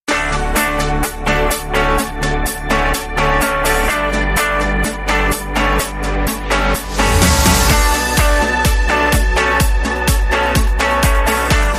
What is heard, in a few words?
No Synth Lead